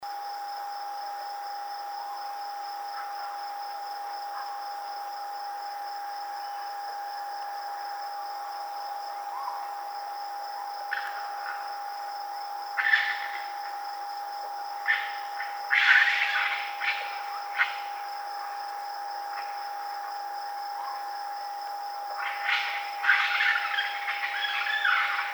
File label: Raccoon; Northern Raccoon (Procyon lotor); Stan Courtney